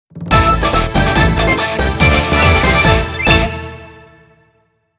End Jingle; Zakuzaku Shichifukujin; Shodai No End Jingle

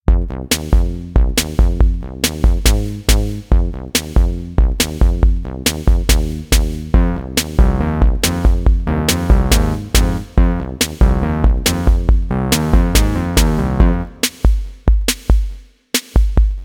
Funk

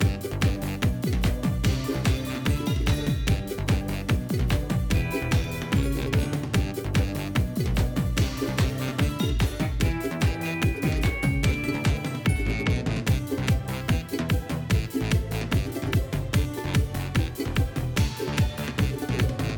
Music Race Docks

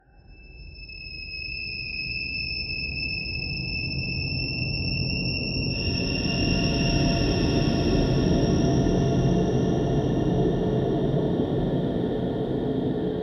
Exploration Ambience; soma gamerip 2015

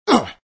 Collision; Sonic.EXE

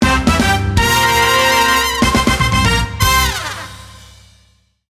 Stinger Game Show Intro; Android

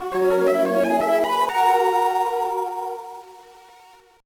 Complete, gba; Level 7, Level 9

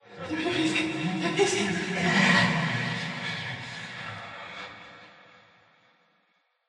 Voices Ambient

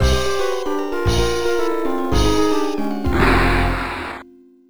Round Clear; Chase H.Q.; Special Police S.C.I.; Saturn; Arrest

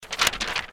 Take Paper; Don't Be Afraid The First Toy

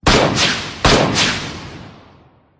Soldier Gun

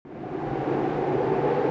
Ambient Wind on the Great Cliff

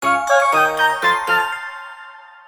Jingle Success; Travel Adventures with Hello Kitty; 3DS

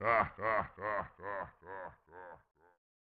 Laugh; the death and return of superman snes; Doomsday Laugh

